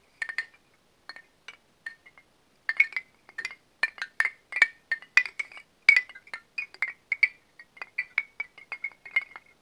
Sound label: Bone Loop; kult